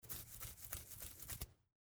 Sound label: scratching head